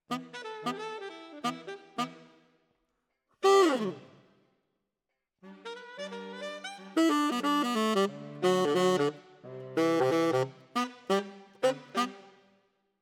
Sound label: Tenor, RNR for piano